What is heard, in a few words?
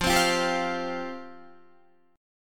F Suspended 2nd F Guitar; Fsus2; strummed